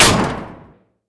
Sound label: sentry upgrading; tf2